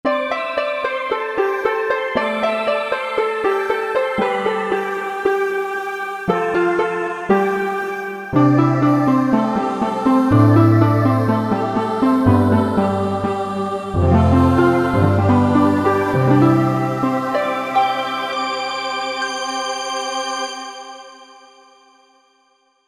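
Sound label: Harp voices, Korg MSC-1S; Harfvoices; Korg MSC-1S Variety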